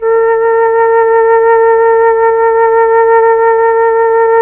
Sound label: FLT, Melotron Flt, Tron Flutes